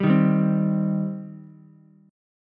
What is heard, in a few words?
C Minor C down Ukulele; strummed; JGuitar